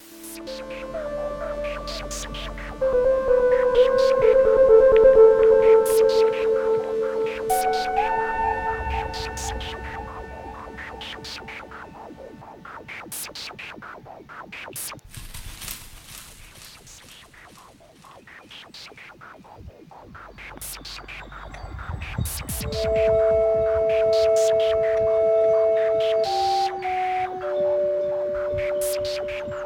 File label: lost in the forest